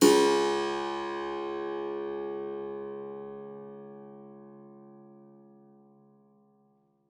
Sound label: SPIN C2, spinet; Xsample VOL-7